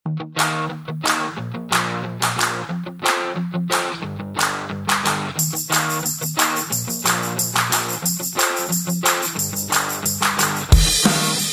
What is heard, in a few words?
Cheerful Kids